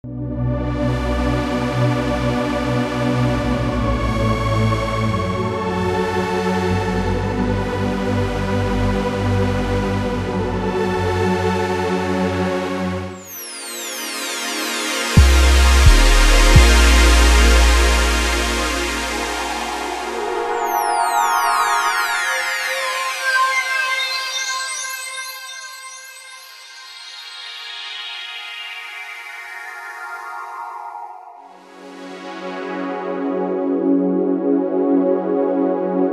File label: Pads